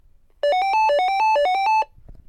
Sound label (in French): Telephone GIGASET DA710 Sonnerie